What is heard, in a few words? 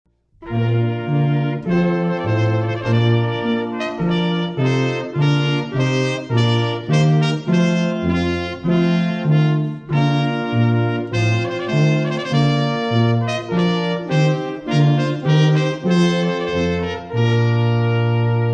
Trumpet Voluntary